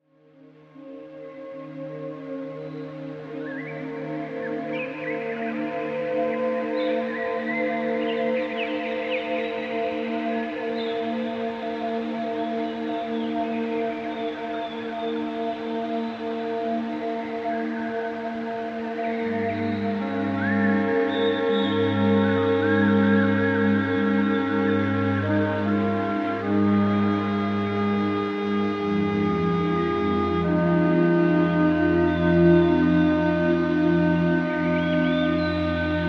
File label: Native Flute 192 Hz